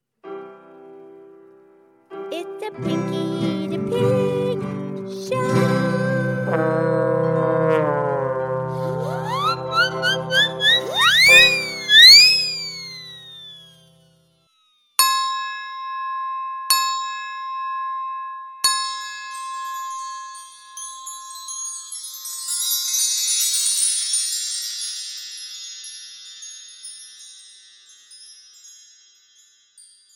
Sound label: instrumental sing along; pinkie the pig podcast